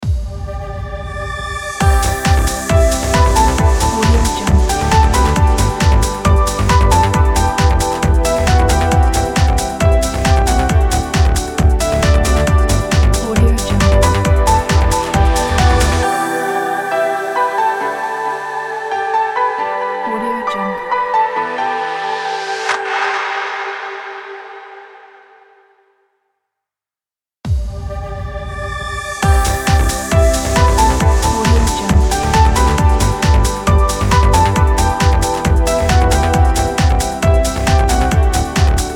happy logo

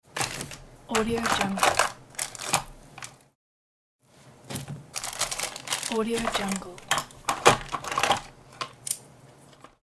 digging through a box

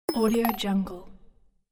percussive target checkpoint

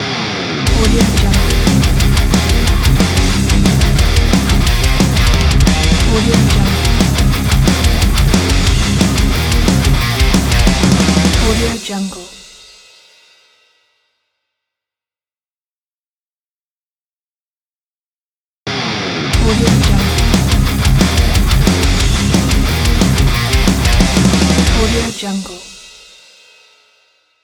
heavy metal logo

